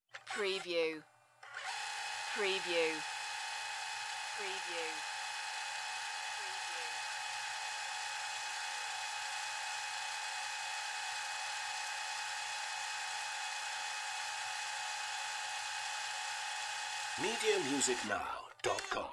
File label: DV Camera Fast Forward